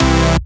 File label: Synth Cuts; Vengeance Samples; VES1 Instruments